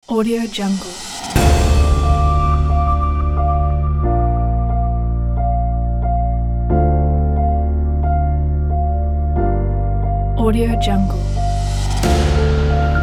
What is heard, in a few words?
epic